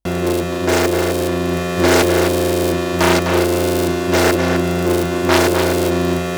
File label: Warp Core